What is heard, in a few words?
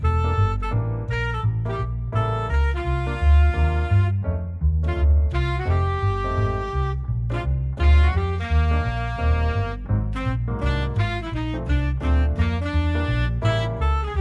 Dormitory jazz